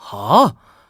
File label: huh; kzibuki